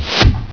door

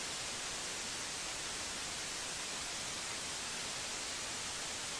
static

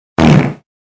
fart

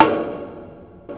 metal

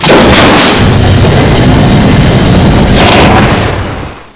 big door

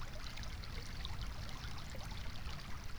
frog

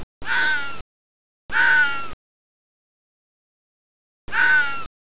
fox

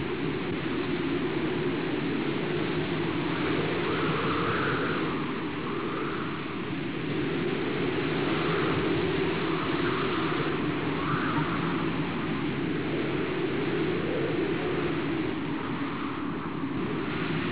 wind

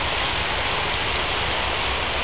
waterfall

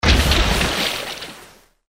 lava splash

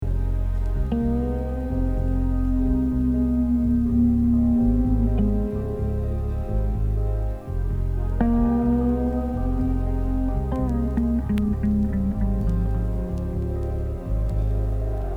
groove

